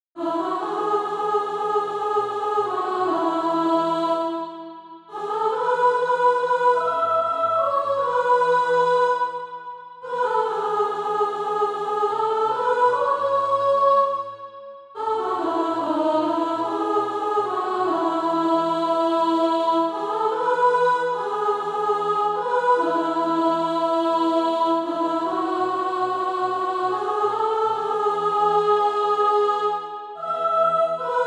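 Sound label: soprano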